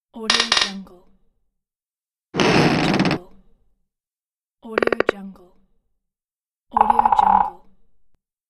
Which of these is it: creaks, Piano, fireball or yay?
creaks